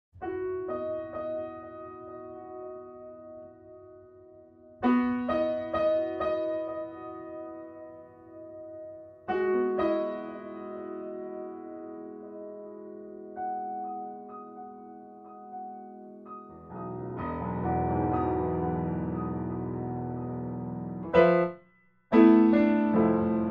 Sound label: far mics